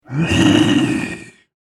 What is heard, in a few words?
Rotting Corpse Zombie; Zomby Sound Effects; horror; Andrew Fleming